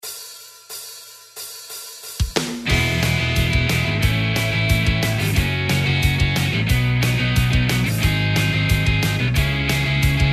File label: practice chord